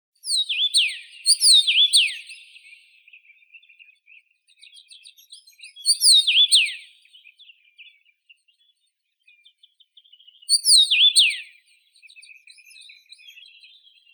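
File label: common rosefinch, Carpodacus erythrinus; chechevitsa obiknovennaya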